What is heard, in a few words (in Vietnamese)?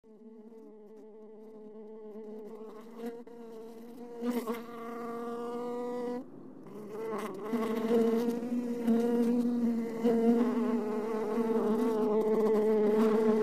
tieng vo ve cua hai con ong